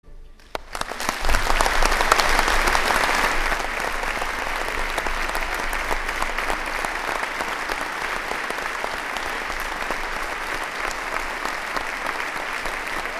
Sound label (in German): Applaus